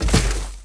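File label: droid body fall large dirt; droids robots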